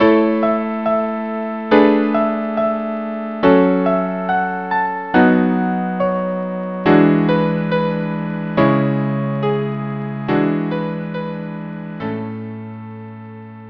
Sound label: quartet